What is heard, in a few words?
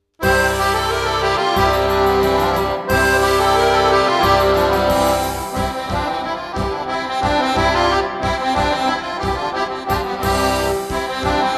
Balkan